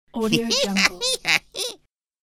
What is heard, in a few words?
evil man creaky giggle